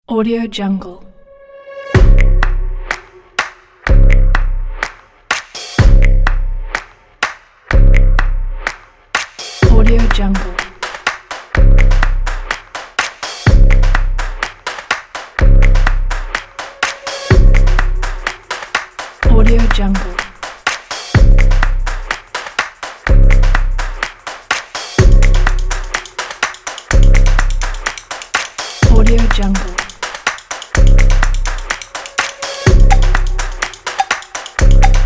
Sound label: modern rhythm